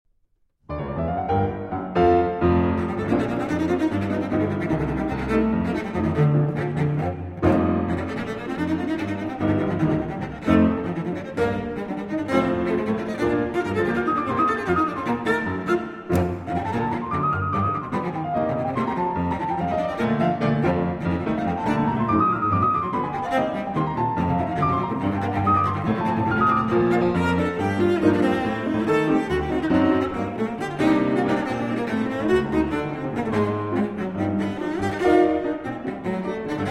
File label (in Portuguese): Violoncelo e Piano Frêvo; Suíte Brasileira